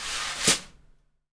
SONOR, SONOR SNARE

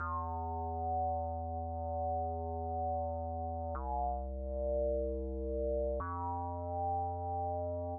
Bass Synth Riff E-C-F, 120bpm, House; Old Skool House; Big Fish Audio; OSH Construction Kits

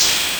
LSDj TR808, Nintendo Gameboy

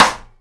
Sticky; Mo' Phatt; Digital Sound Factory Mo' Phatt

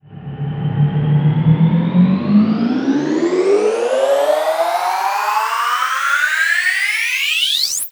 FX Sweep Up; Vengeance - Essential Clubsounds Vol.3; Sweeps Up

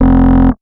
bass classic ribbit F; Polyend; ARC Noise

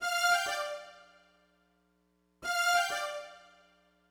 Smooth Groove Strings, 70s Analog Funk; Sonic Foundry (Sony Creative Software); What it Is!

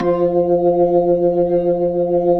B-3 Jazz, keyboards

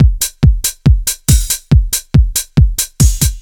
Kick Loop, 140bpm; Trance; Kick Loops; Zero-G Trance Inducer; Drum Loops 140bpm; 90 s Sample CDs

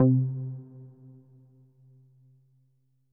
C2, synth waves; Music Store Sound Service; Einstein

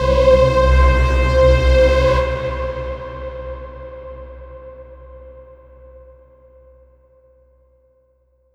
Detuner; Polyend; Plughugger

